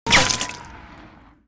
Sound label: Water Splasher